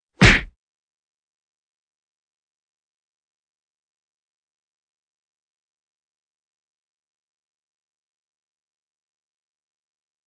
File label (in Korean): Fights, 싸움-전투; 헐리웃특수효과음